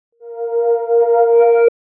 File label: Tiles Riser